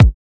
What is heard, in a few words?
kicks